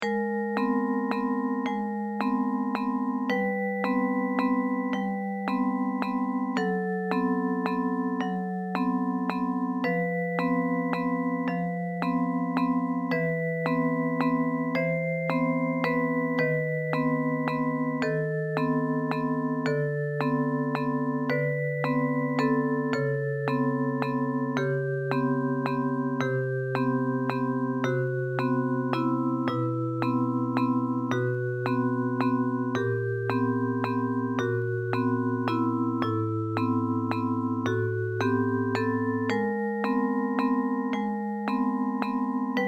simple waltz

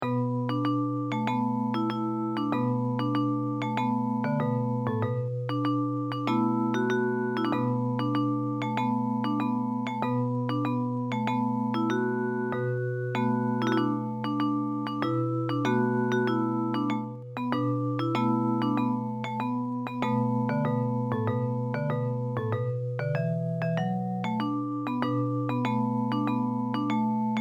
japanese folk song; joban tanko bushi

